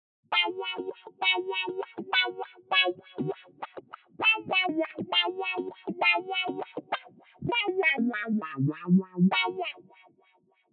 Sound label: filter guitar; shaperbox